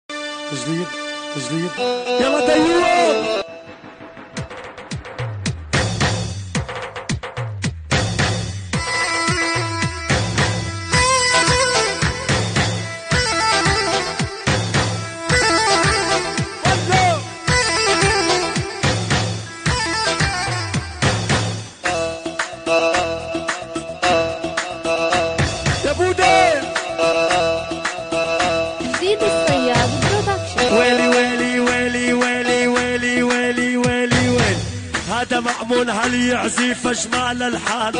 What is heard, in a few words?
music shoti arabi